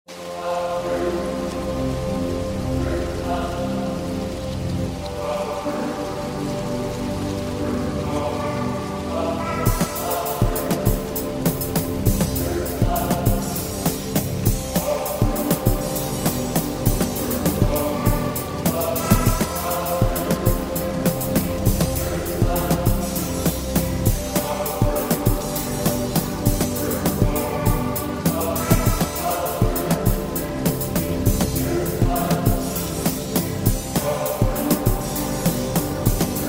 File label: beat